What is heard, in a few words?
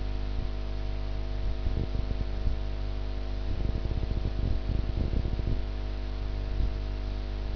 fifty Hz